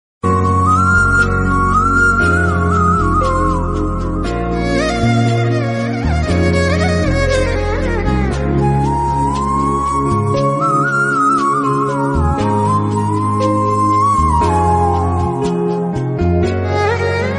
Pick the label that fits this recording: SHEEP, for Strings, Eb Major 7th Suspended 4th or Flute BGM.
Flute BGM